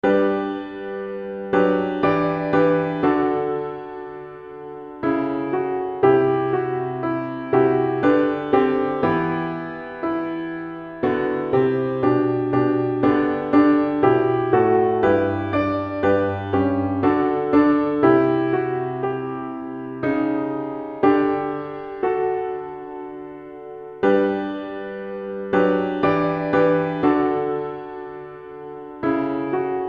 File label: winter rain piano; newcentury